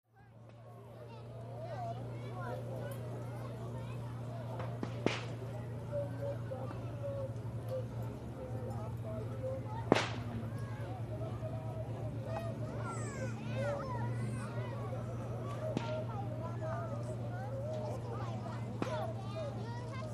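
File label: Outdoor Barbeque Party, laughs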